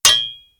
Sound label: metal pipe hit